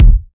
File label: Smooth Bottom End Kickdrum Sample D Key, Royality free bass drum sound tuned to the D note